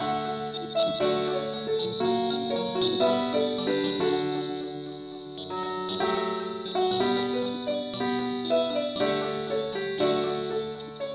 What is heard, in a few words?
keyboard only key d